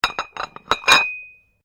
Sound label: Weights Dumbell Add Weights, Gym weight lifting equipment; Sports